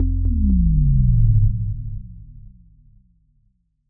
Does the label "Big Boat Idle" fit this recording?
No